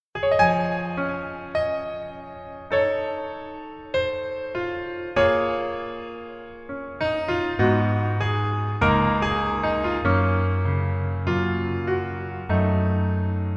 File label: Piano ver